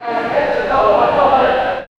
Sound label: an happy sound when you